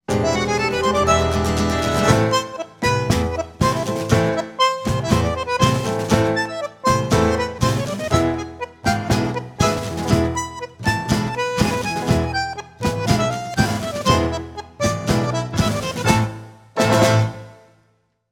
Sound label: Tango, ringtones; Samsung Galaxy A5 Ringtones